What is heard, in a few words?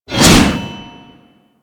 Hammer Whoosh A; Warframe; Weapons